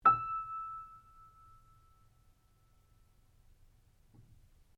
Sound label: PIANO UNTUNED E5 MF, Baby grand piano left to fall out of tune; Pianos; Musical